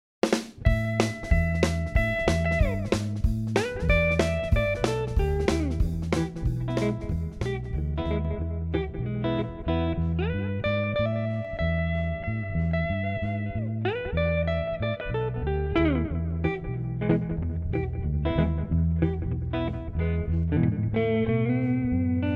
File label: Train Beat play along